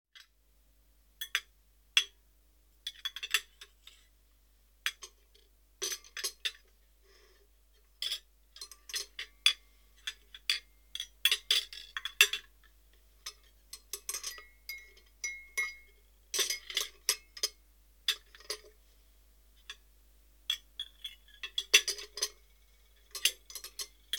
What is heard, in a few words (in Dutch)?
Serviesgoed; KMP Film Video Sound Effects